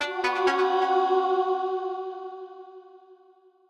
Effect Levelup; Shalnor Silverwind Saga

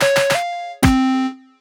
outro; golotol

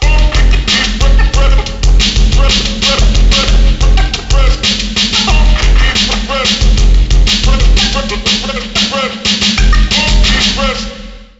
Ditty; NBA ShootOut 2002; PS1

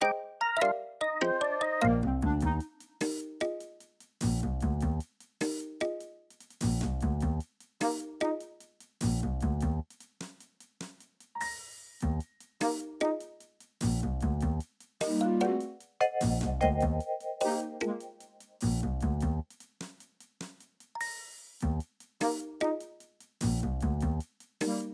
Dice BGM; GameFirst; 1-2-Switch

